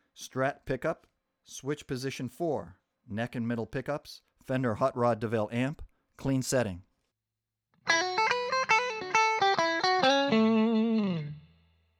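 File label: strat neck mid hot clean, guitars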